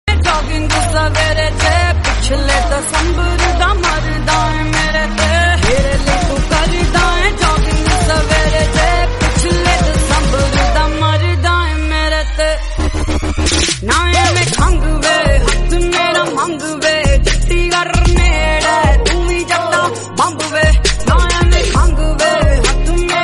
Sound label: Punjabi Ringtone